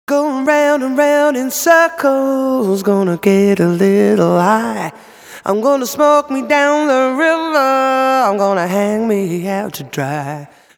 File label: vocal